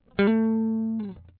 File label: type; msn